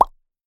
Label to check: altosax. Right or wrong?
Wrong